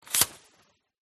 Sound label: Umbrella Compact Open Slide; lesser vibes